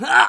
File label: hit, pain; mohaa